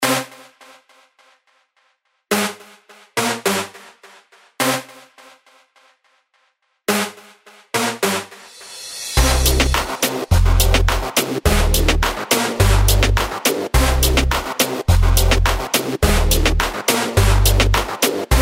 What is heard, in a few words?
electro